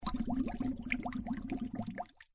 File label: object sink bubbles